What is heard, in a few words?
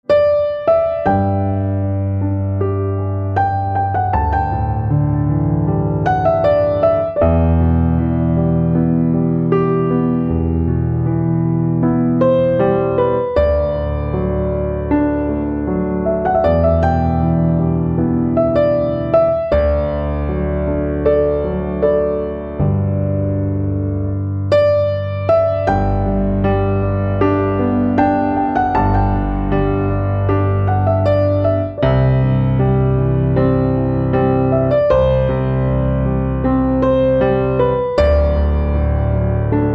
Piano Slow